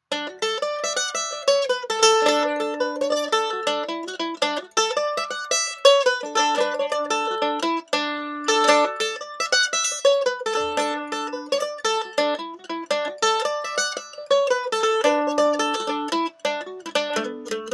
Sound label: Mandolin Pick Comparison